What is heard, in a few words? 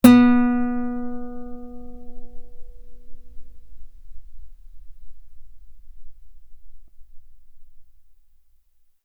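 Guitar ff B string B3; sul B; OmniPower4296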